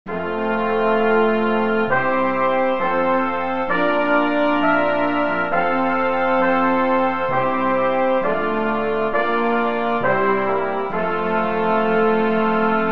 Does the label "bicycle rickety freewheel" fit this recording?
No